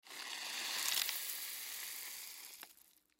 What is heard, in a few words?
Rock Salt Pour Out; Foley; Rock Sound Effects; Materials; Blastwave